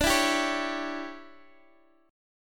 D Major 7th Suspended 2nd Suspended 4th, Guitar DADGAD